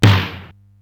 SDS9 SN, Simmons SDS-9, Snares

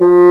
BASSOON F3, WIND

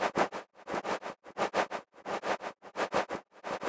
Rythmic Noise 130BPM

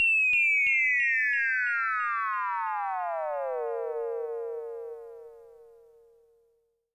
laser, Korg Electribe ER-1

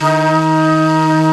Flute, Singin' Flute

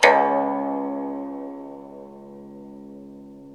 SU GZHENG, Earth Tones

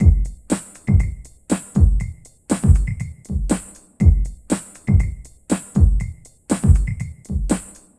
Clave Beat, Composite Beats; Sonic Foundry (Sony Creative Software); Myths of Technology

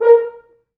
4 FrHorns; East West; Quantum Leap Horns Sax; 4 FrHorns MOD

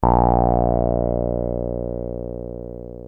303 A1, TB 303; Hollywood Edge; Giorgio Moroder Rare Synthesizer Collection

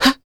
FEM PERC, Vocal Jazz FX

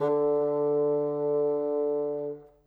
Bassoon, Orchestral; Sonic Foundry (Sony Creative Software); Orchestral VOL-2; Modern 07

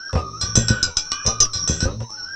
CLANG DRUM, rhythm loops; Rarefaction; Poke in The Ear With A Sharp Stick

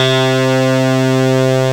RESO SYNTH, swing synths; Zero-G - Funky Elements

